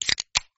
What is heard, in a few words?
sound press; Wheel Race